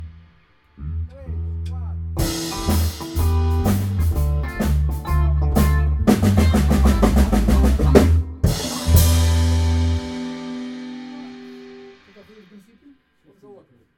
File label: Blues endings